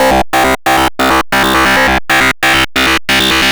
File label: GRUNGE